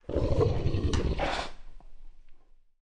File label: beast idle; morass beta; morassbeast